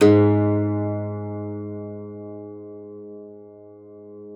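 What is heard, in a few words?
harpsichord G#2; KLAVICYMB; Xsample VOL-8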